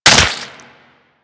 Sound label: Dagger Flesh Impact; Dual Daggers; Hit Effects; Weapons